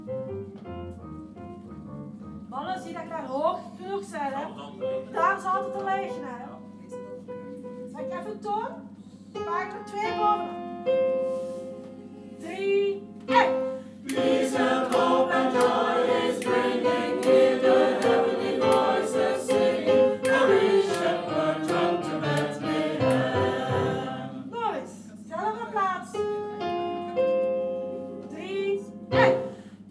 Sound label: SATB